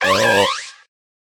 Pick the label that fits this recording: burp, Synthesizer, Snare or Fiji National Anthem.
burp